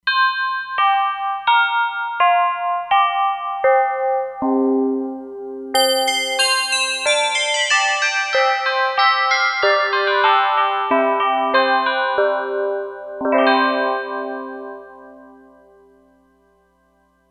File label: Chimes, E-mu Proteus-3; Factory patches